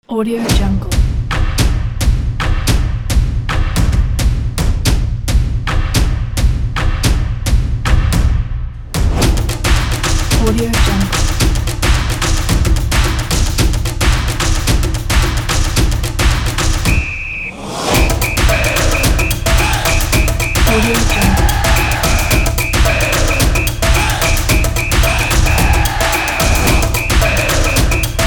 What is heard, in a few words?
football percussion